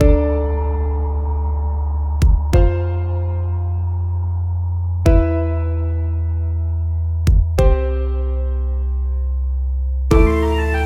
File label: Trap; RedVilla